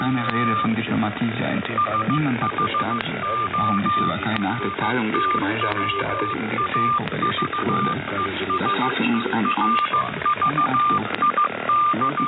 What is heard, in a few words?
6030 kHz jamming